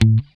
GUI A2, E-GUI MUTED; Sound & Vision; Gigapack I CD 2

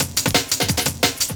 Perception No Kick 175, Drum&Bass Breaks; EZ Rollers

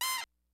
HITS BITS; Kid Nepro - Elite

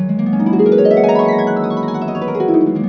GLISS, HARP; AKAI S-Series CD-ROM Sound Library VOL-2

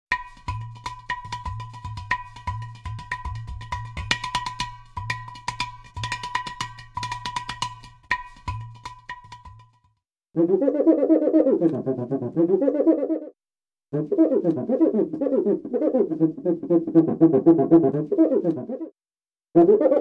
Didgeridoo And Overtone; Good Stuff Audio

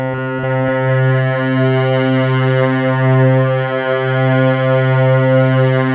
BIG PPG, OLD PPG; Sound Gallery - Charged

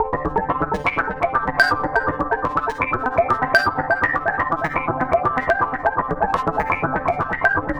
Analog Synth, Arpeggios; Synths; Electronic Point Blank

